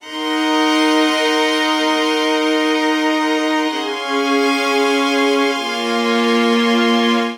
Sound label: Progression iv6-IIImaj7-i9-iv6-IIImaj7-i7 Bb Minor 130 bpm, String Quartet; MIDI Clips; Shiver In The Snowstorm; Ableton; Factory Packs; Spitfire Audio